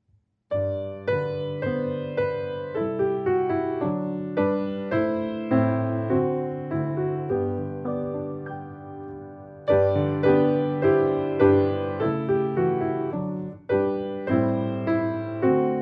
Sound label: Hymn Music